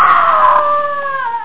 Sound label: wail